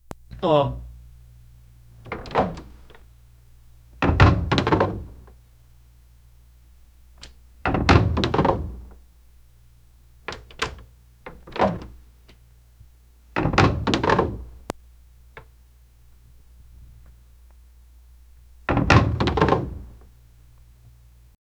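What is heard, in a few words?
antique wood door open close; doors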